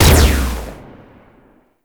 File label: Spear Gun Shot; Hoplite